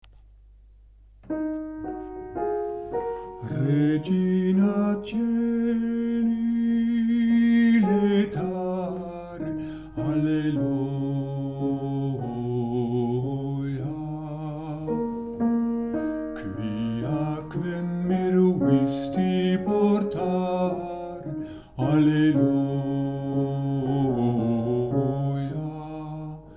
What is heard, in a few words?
man regina vogel